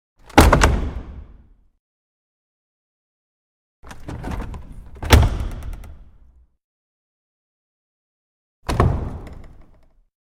Small Wooden Pulpit-Choirstall; Hollywood Edge Historical Series; cd4 Castles Palaces & Monasteries

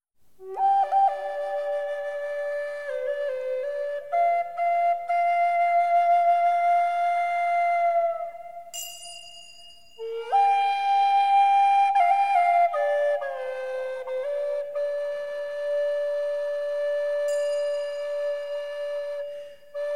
Flute

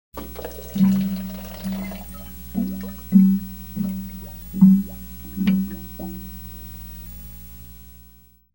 water bubbling in a sink; SOUND EFFECTS1

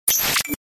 Hardbreak; Best Of N2O Library; Breakers & Transitioners

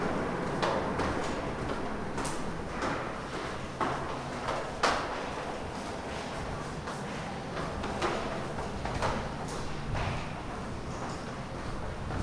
STAIRWAY, ROOM NOISE; 1000fx

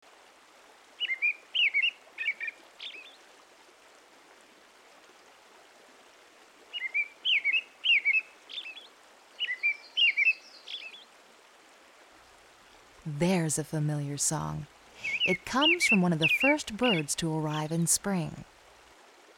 robin

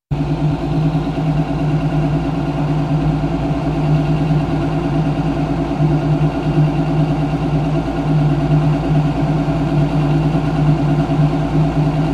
Pump Motor Small Running Steady; Traffic, Sirens, motors

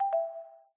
error

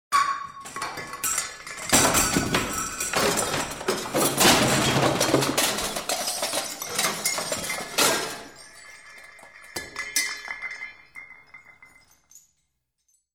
Overstuffed closet, SOUND EFFECTS; SOUND EFFECTS1

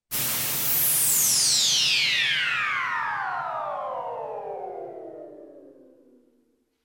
POWERSHOT

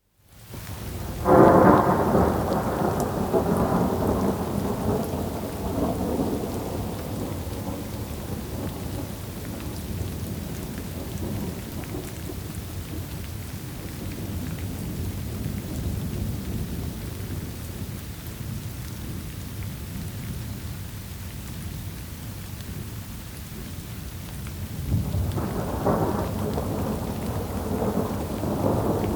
Thunder With Steady Downpour; Dr. Sound Effects; 210 Nature Sounds - 20 Hours of Relaxing Natural Ambiences for Meditation and Sleep

